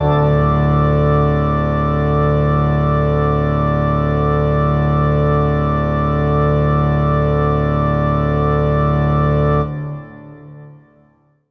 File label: pipeorgan; Notes